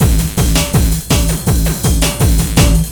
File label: LOOP, Eurotech; Sounds Good